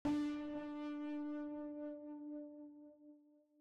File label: Mushrooms Bloom